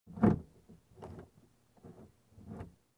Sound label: Opening Trunk